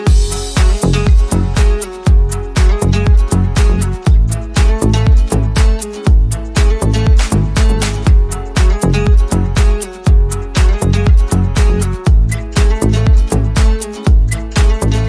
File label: Drums Electro Ringtone